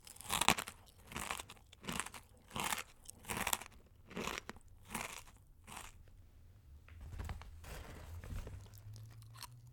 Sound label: Chewing Potato Chips